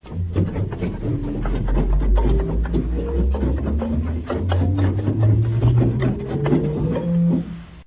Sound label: subbass, organ